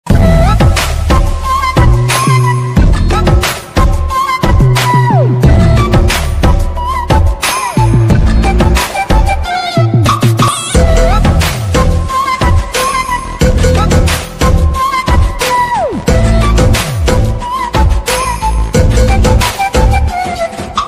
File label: BGM hindi ringtone